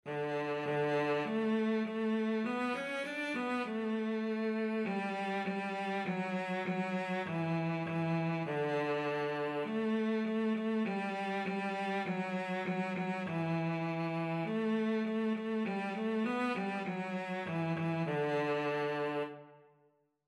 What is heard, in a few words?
Baa Baa Black Sheep, cello